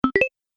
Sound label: Game Item Selected, Video Game Sound Effects